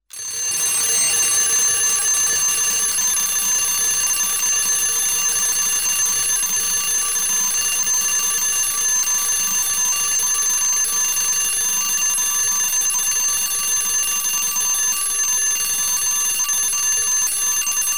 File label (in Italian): CAMPANELLA SVEGLIA SUONERIA